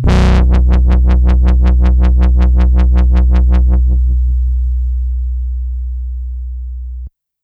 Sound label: BASS